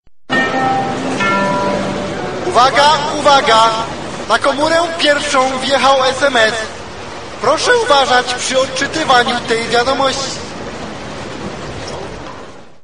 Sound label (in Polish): wjechal sms